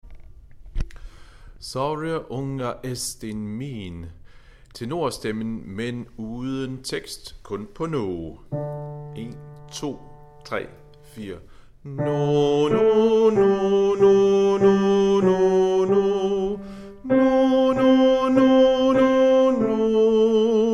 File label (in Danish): tenorstemmen; harlev koret